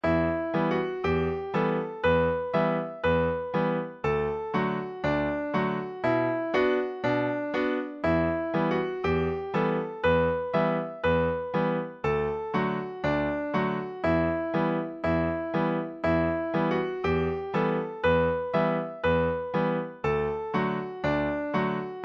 polka; 69th street

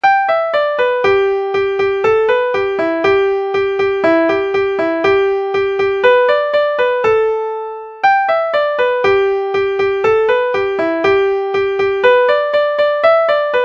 polka; chinese

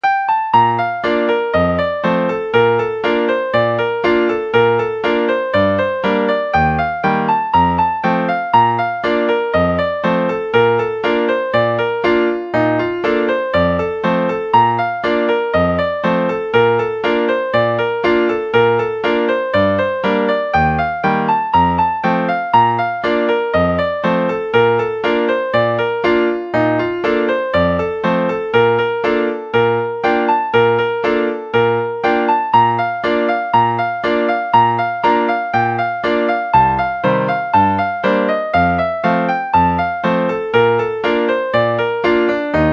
niagara hornpipe

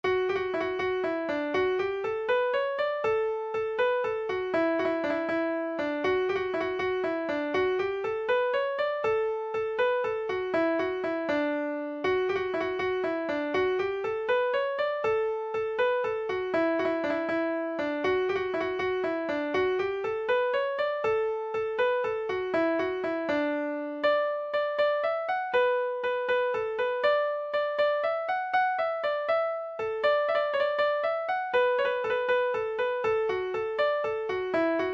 jig; kerfunken; irish

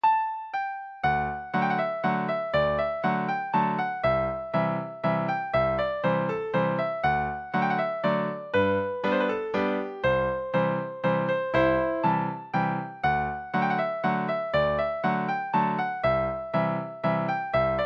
waltz; pops

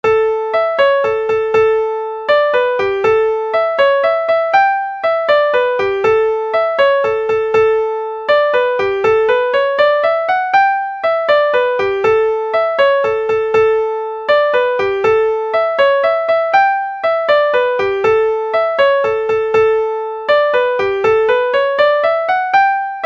jig; gravel walks

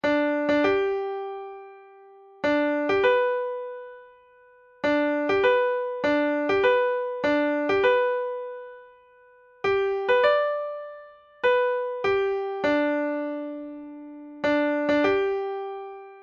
TAPS

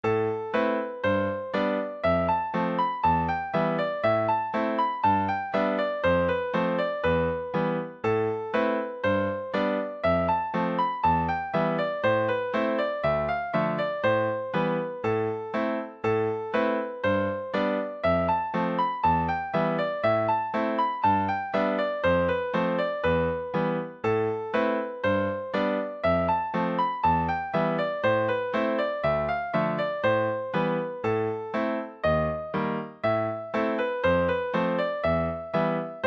bobcat shuffle, session tune

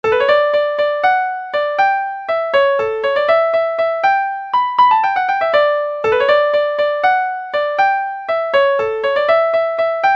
bugle waltz

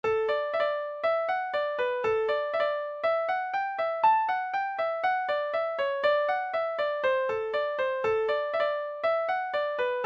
lost banjo

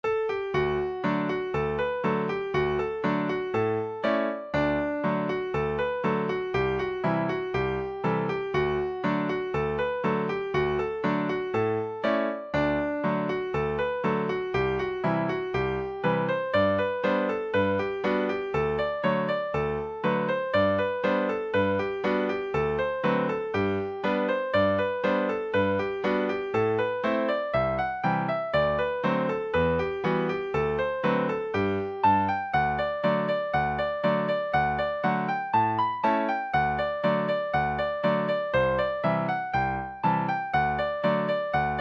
chorus jig

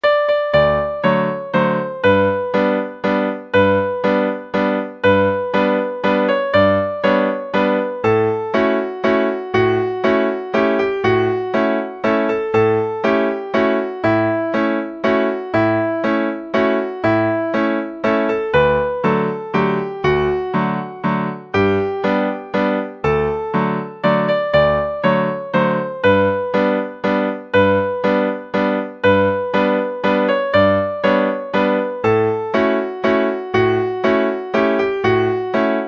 cajun waltz

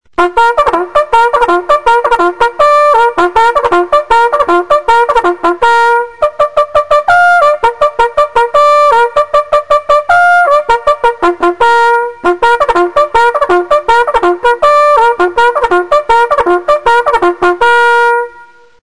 REVEILLE, bugle calls

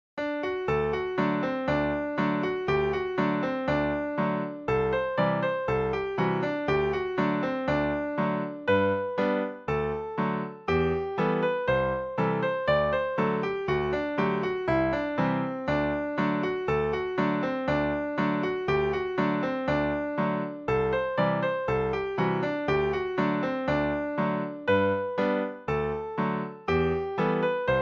puff adder step dance, session tune

